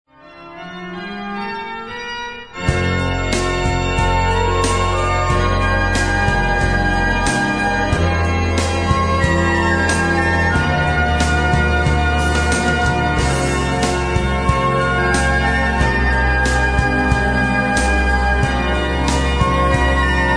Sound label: music